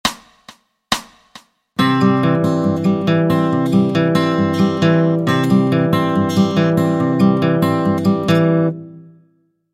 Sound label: Travis Picking